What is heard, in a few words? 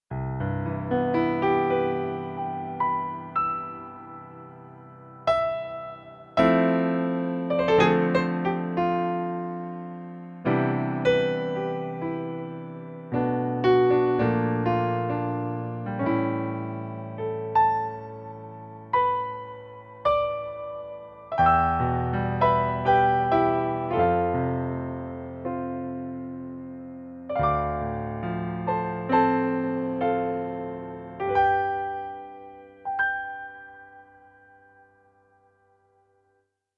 Yamaha YC88 CFX Piano